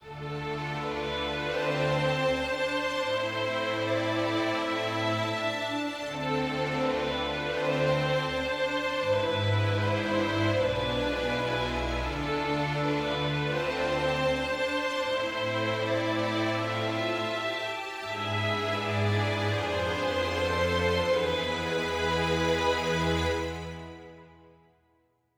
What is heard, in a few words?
sad forte